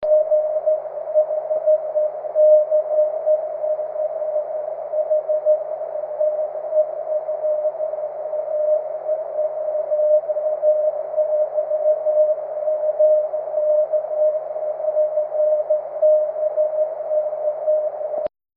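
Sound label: continuous wave, 23cm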